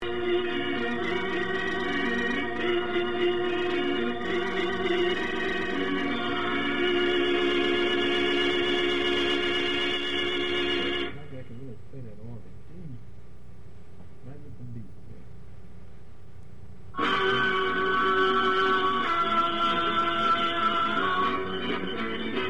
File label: radio music mix; USO radio music mix